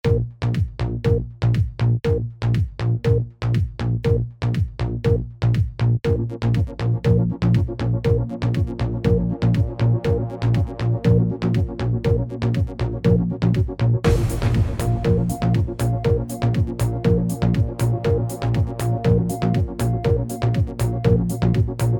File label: Countdown (no SFX)